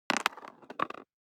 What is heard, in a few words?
DICE on Cardboard, Throw and Roll, Standard, 3 Three Dice; Samples; ArticulatedSounds; DICE Sfx Party (Extended)